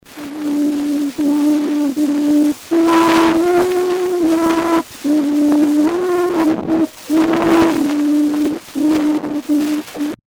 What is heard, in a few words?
Au Clair de la Lune